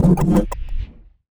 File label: Select Vehicle; Tab Select; Advanced UI; User Interface